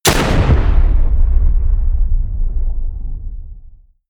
shot

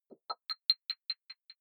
button echo distorted high

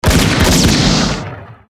shot seq

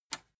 metal button tiny release enable

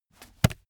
heavy book shut

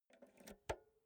insert jack multi socket slow

